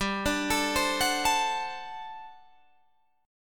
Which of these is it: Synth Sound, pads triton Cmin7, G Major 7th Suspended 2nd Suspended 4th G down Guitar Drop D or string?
G Major 7th Suspended 2nd Suspended 4th G down Guitar Drop D